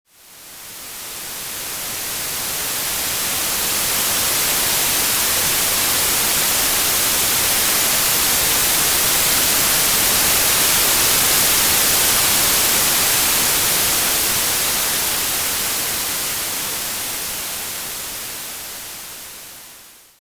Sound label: noise